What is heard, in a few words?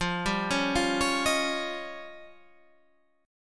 F 7th Suspended 2nd Sharp 5th